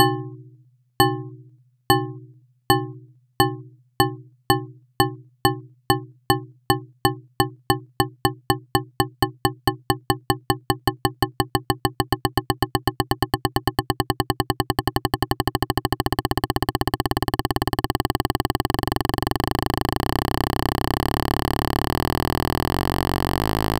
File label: burst